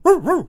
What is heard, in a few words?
DOG, VOCAL FX; Spectrasonics Vocal Planet; Jazz FX